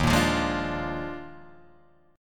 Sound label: Eb Major 7th Suspended 2nd Suspended 4th Eb Guitar Drop D; strummed; 1,1,3,1,3,1; EbM7sus2sus4